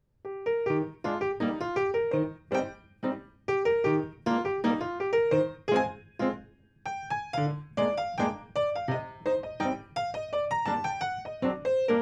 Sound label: Pizz